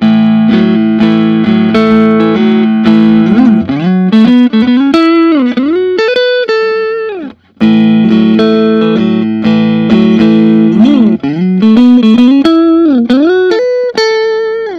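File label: fender custom shop 60 stratocaster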